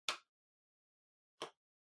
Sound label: PC Keyboard Far Shift